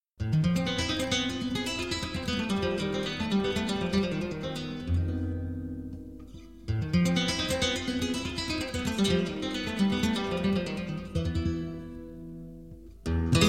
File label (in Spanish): Solea intro, flamenco